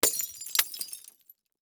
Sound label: Crash Wine Bottle Two Smash; Crashes